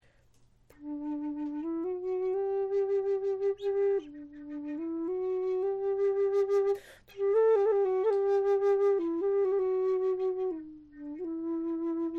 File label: xiao